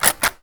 defib break; defibrillator; gmod; ncs defibrillator aeron networks

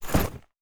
bat holster; melee weapons; tfa starwars melee weapons pack

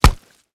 blunt light; tfa starwars melee weapons pack; nmrihimpact

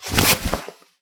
hammer draw; melee; tfa starwars melee weapons pack

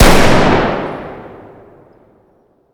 fire dist int shotgun; gmod; arccw uc commons attachments

